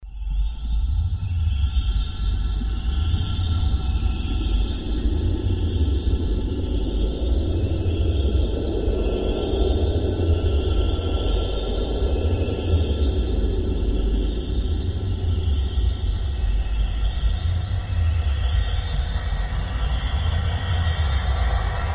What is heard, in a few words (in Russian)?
Zombirovanie gipnoz